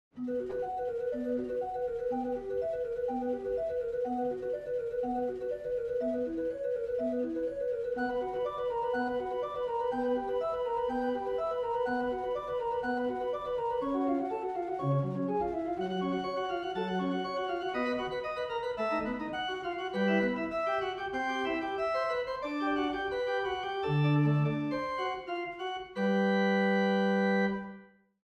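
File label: neo-Baroque positive organ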